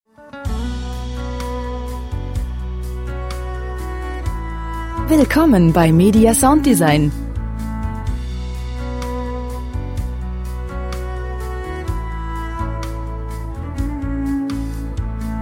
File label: soft streichzart